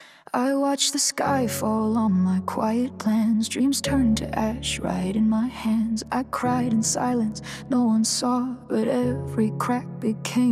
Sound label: Fireproof Cinematic; Tempo